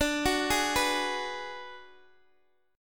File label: D 6th Flat 5th D down Guitar Open G, chord